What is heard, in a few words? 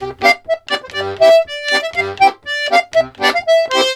120 POLK, Accordions; USB Soundscan vol.40; AKAI